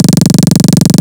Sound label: Tight Kick Drum